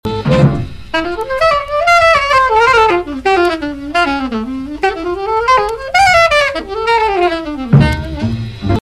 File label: Tunisia Break